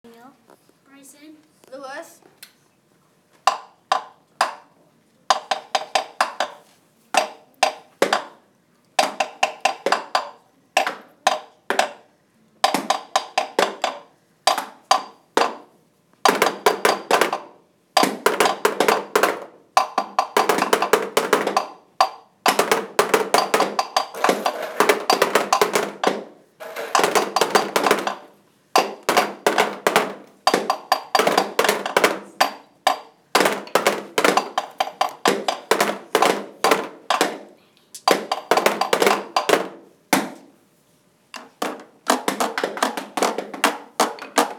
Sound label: found instruments